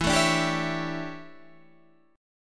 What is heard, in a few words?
D Major 7th Suspended 2nd Suspended 4th